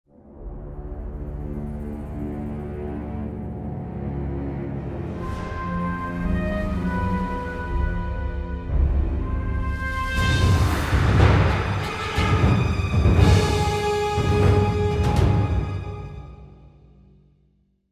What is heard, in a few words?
Suspense Action